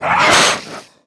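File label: Attack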